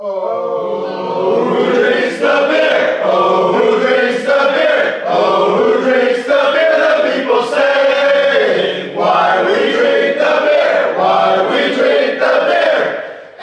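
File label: cauldron anthem, football songs and soccer chants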